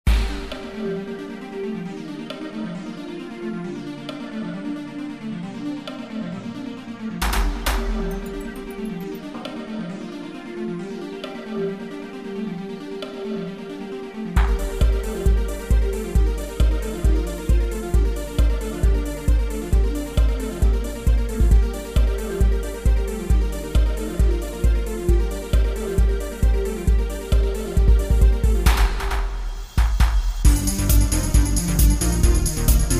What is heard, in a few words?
Techno; wersi